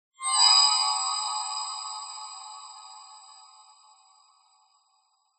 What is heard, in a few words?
stab whistles cluster elements slight ascend dissonant; Skyclad Sound